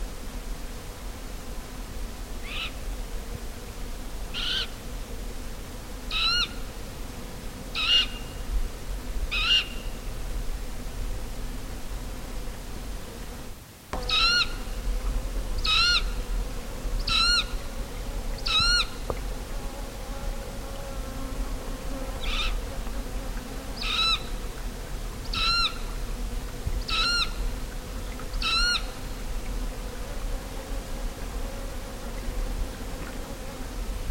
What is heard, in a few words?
Geranoaetus albicaudatus Busardo coliblanco